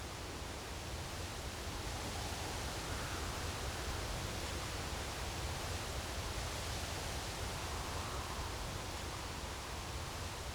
room, drums; virtual drum sounds; custom drums

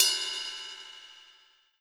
bell, virtual drum sounds

